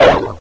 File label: pit drone pain; scmod; alienassassin